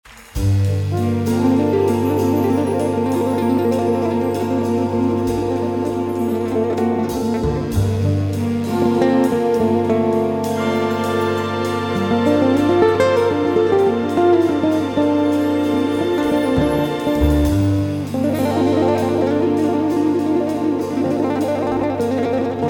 Big Band; Atonal

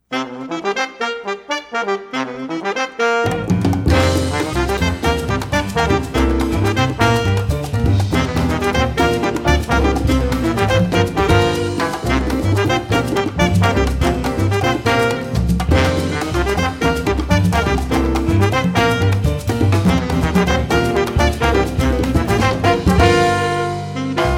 Quintet Blues

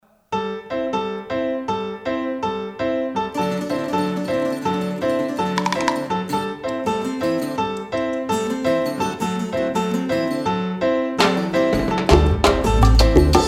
Salsa Mambo Music

